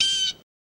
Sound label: Blue Jay Call Short